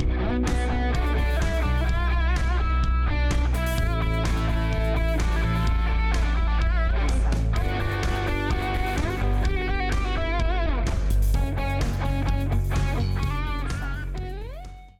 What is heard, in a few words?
lock lit, music; BALLY; TWILIGHT ZONE; Pinsound